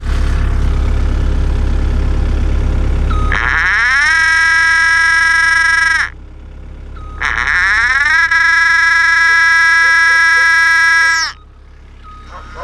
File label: CAT 304 C final drive noise; caterpillar 304c cr right track does not move